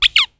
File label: Squeaky rat